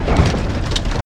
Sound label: minecart, inside